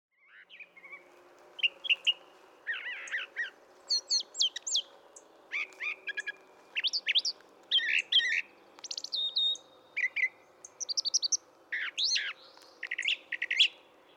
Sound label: Brown Thrasher